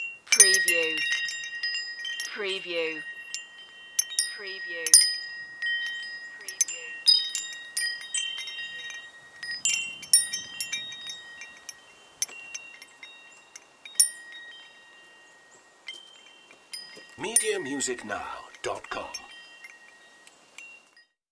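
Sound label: Windchimes Outside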